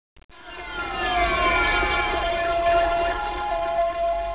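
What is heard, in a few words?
AMBULANCE; Talking E-Mail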